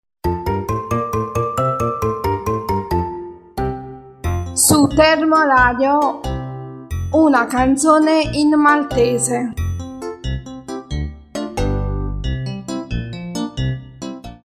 Canzone in maltese

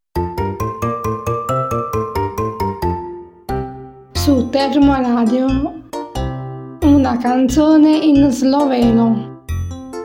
Canzone in sloveno

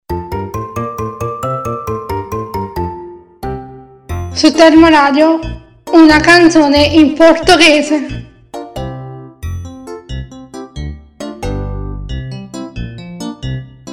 Canzone in portoghese

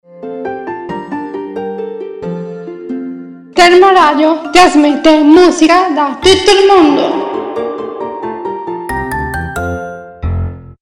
Musica da tutto il mondo

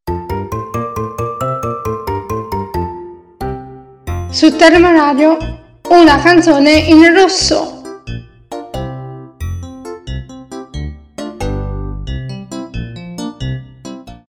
Canzone in russo

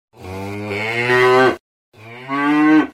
mucca; animaliFX

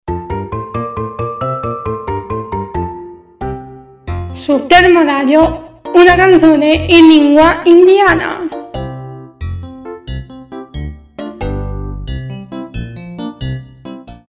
Canzone in lingua indiana

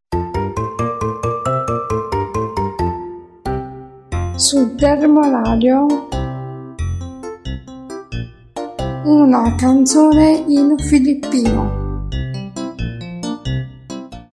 Canzone in filippino

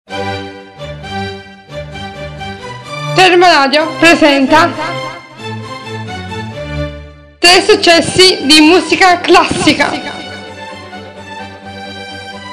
classica, jingle; successi

